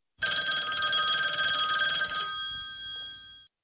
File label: phone